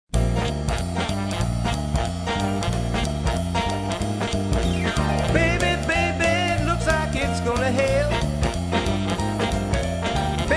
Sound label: vocals